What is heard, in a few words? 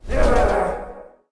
phantom archer damage, monster